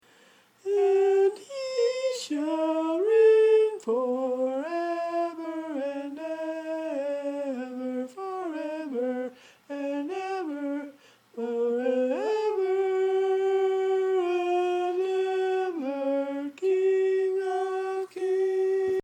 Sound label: tenor